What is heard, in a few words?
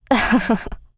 laugh; Eternal Return; Katja; ENG